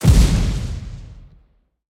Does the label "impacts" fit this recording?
Yes